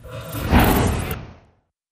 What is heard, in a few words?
zoom in; Wild Ape